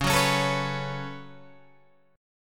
C sharp 7th Suspended 4th Sharp 5th, C sharp, down, Guitar, Open G